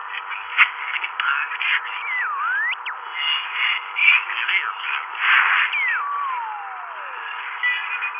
radio

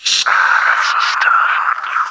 stimpack; The Thing

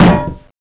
landmine drop; fallentitan

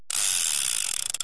spin chamber; neondragon

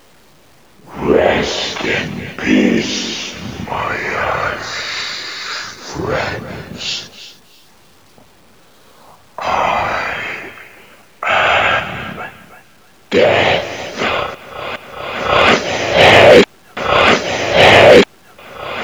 death

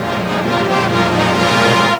quest complete; zombie apocalypse

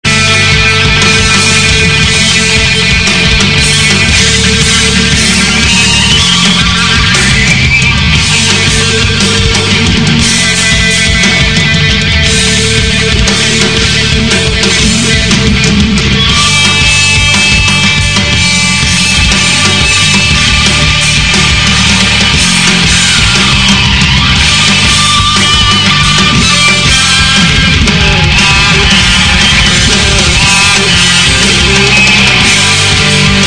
loading

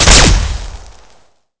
blaser

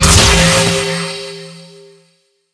scanner pickup; The Thing

